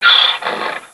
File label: DONKEY